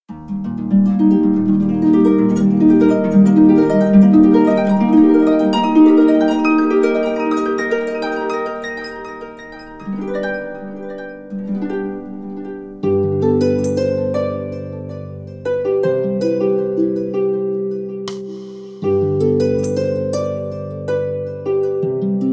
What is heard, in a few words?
Magic Strings